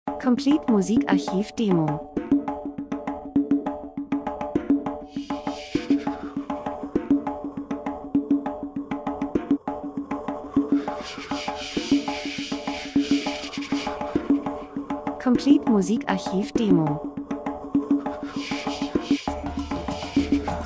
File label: drums; Percussion Afrika Traditionelle Trommeln Sounddesign Land und Leute Buschmann Reisefilm Natur Dokumentation